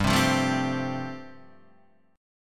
G 7th Suspended 2nd Suspended 4th, G, Guitar, Open D; 5,3,3,3,3,5; down